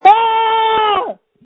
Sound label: Scream